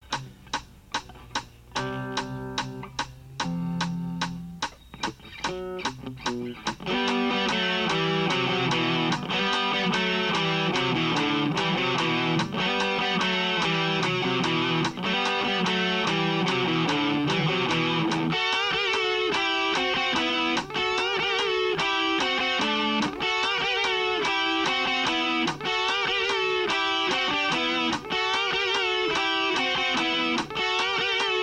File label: Riff Tapes